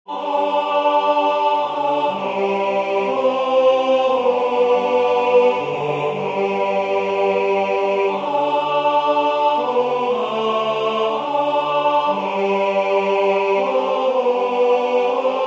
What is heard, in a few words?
tenor; Tama Ngakau Marie